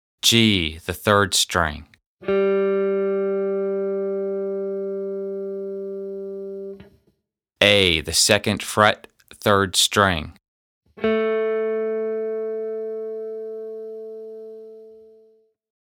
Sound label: Notes on 3rd String